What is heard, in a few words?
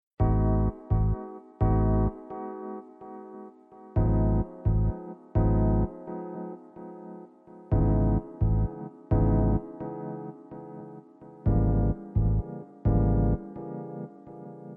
vocally 432 hz